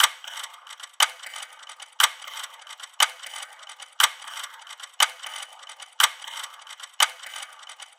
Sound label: clock suspenseful creepy distorted; federico soler; alarm sounds